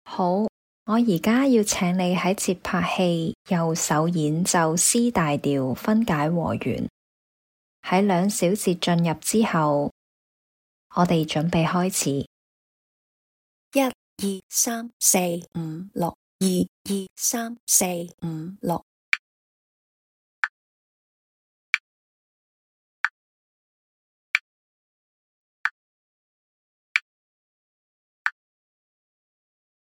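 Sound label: C major broken chord right hand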